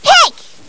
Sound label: peck